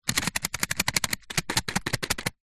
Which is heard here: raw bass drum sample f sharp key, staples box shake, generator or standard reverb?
staples box shake